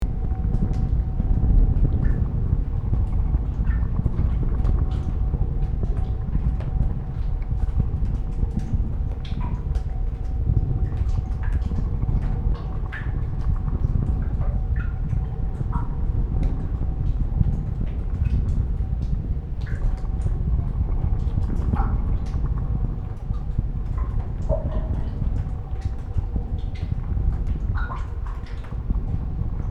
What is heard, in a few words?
Volcanic cave ambience underground; nature